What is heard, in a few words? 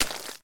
clay step; Golden Crayon Sounds